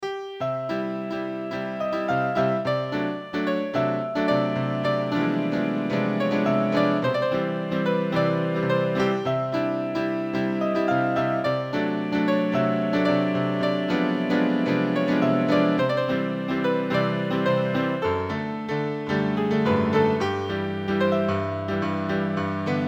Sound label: Bossanova Mazurka